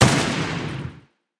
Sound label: gun